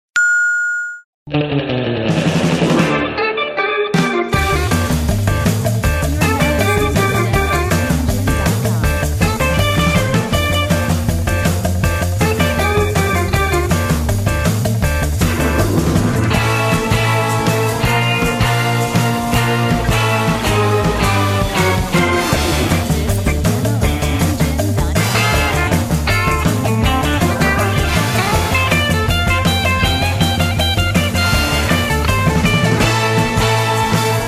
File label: Fast Boat